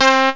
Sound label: saw brass